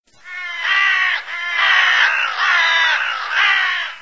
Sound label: Crow Sms